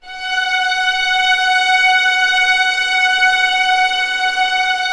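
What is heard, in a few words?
STR F#4 FF, strings; Sound On Sound - Peter Siedleczek's Orchestra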